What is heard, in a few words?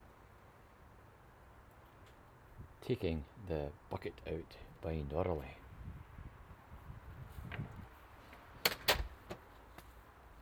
taking the bucket out binaurally soundscape